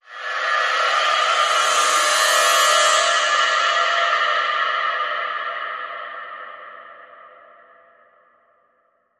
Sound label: Carillon Scrape